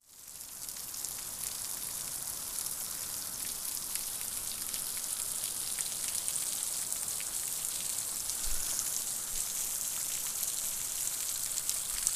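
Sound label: Thunderstorm Rain, Outdoor Ambience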